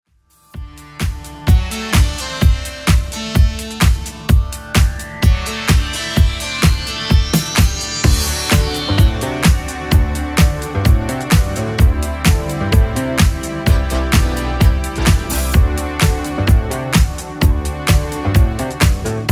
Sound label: 80s Club 128 bpm CLEAN